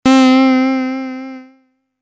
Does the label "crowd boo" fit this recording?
No